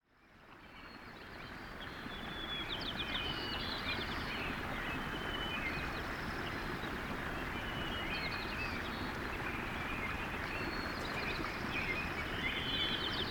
Mountain Freshet; Elementals

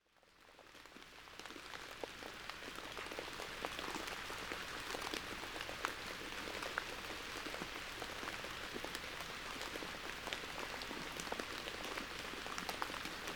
Winter Rain; Elementals

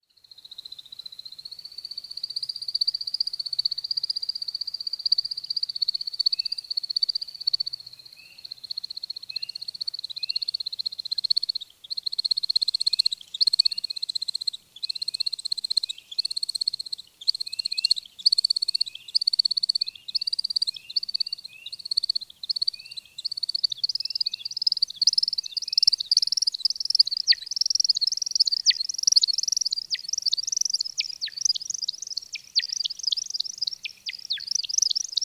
american woodcock flight display

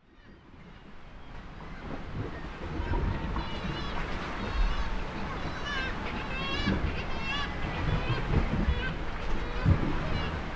Kittiwake Cliffs Ocean Waves

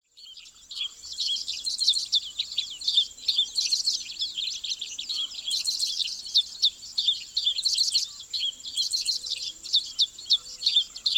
dickcissel frenzy but lotsa traffic

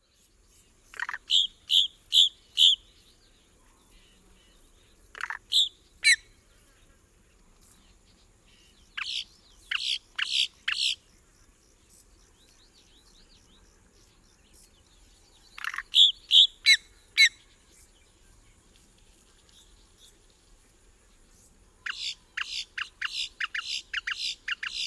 boat tailed grackle adult; COMPOSITE abridged

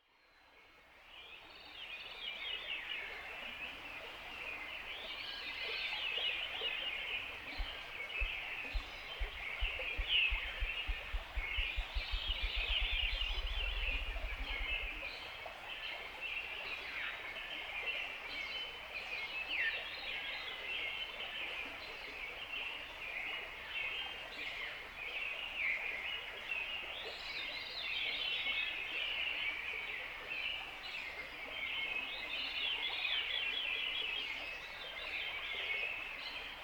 Mountain Brook Birds and Brooks

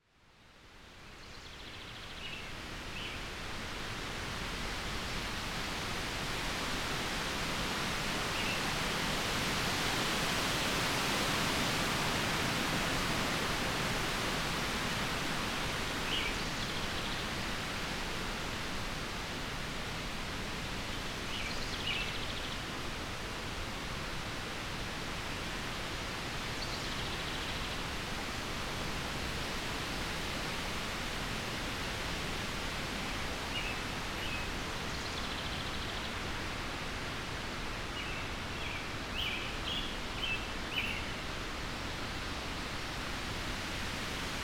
Windy Forest Atmospheric Surround

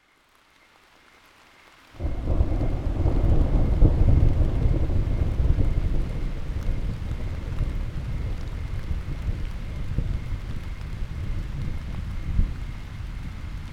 Thunder Crickets; Thunder Gods

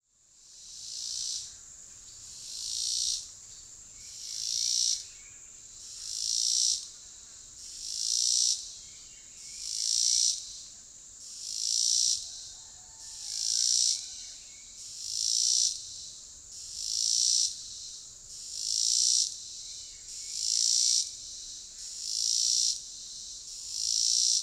cicada; robinsons cicada

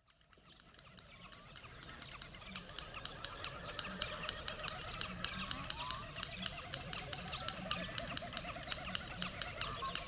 Snipe Central Voices of the Night

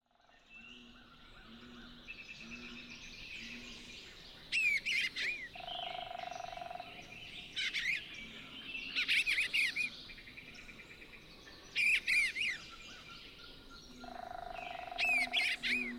Bluebird; stereo binauralized

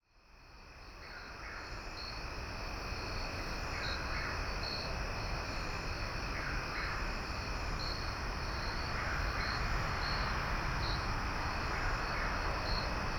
Wind in the Pines; Elementals

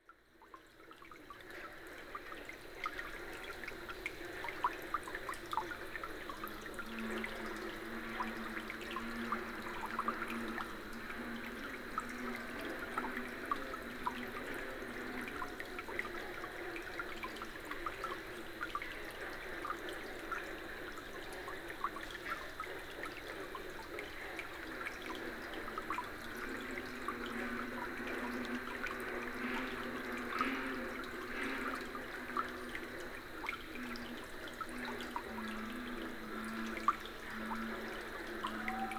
Maumee Wetlands Night Into Day

